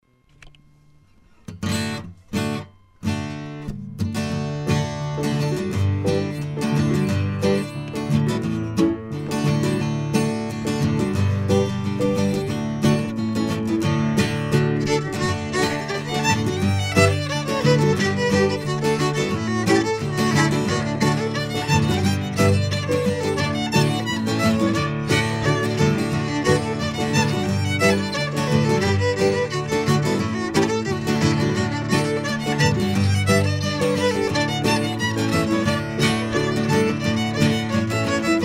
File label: Liverpool Hornpipe, fiddle tunes